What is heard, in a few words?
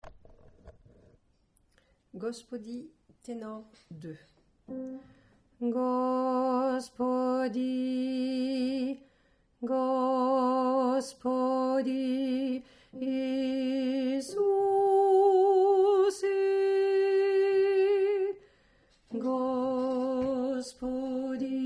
Tenor; répertoire russe